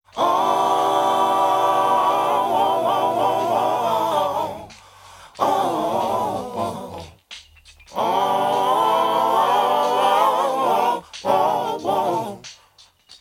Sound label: Acapella Interlude